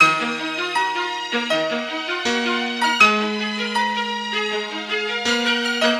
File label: keys